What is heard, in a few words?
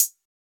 Hi Hat Closed